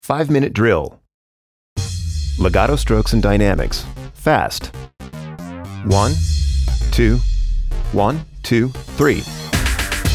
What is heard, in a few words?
pad legato dynamics fast